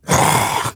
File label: attack; slender; zombiebm